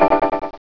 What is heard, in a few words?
open door; Catacombs